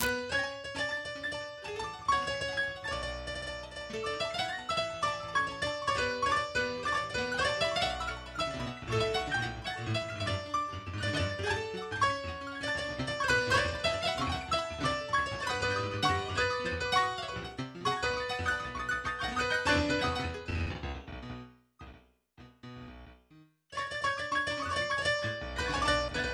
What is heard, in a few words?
Violin slump